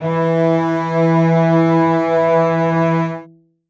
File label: string acoustic, cello